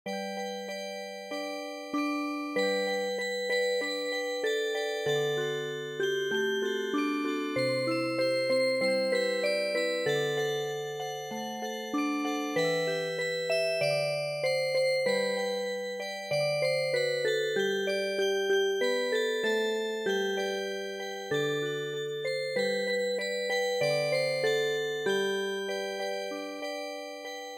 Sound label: Music Box